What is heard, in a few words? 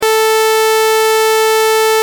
saw 440